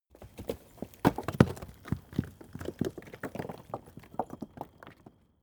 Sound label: stone medium fall long